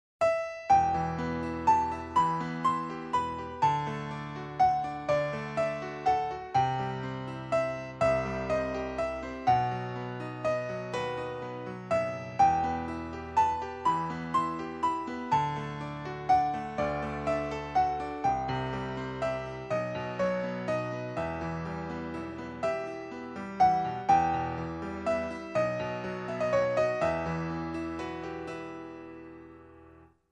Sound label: Greensleeves, Solo Piano